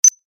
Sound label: Action confirm blip